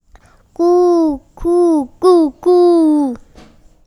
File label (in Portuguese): arremedando o jaó; Pia o pio… Os pássaros e as árvores que fazem parte da vida